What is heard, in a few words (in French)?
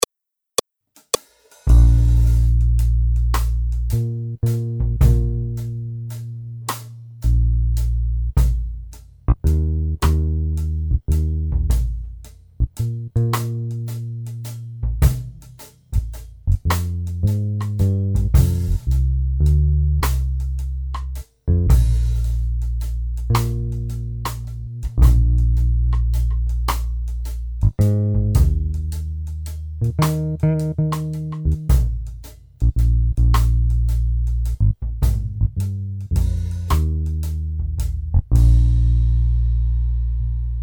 Waltz & blues, Accompagnement seul